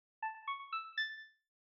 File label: sfx signature